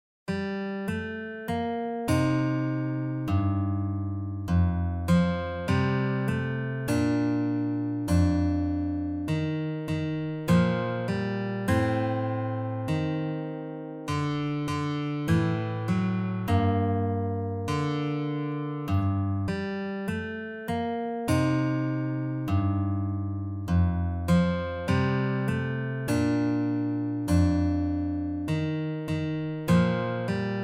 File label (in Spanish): Melodia con Bajo